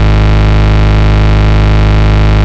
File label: Bass, Root G#; Vengeance Samples